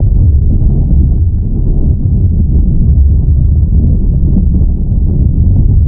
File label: Maelstrom Ocean; ambience